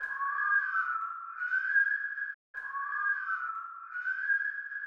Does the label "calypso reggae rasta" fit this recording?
No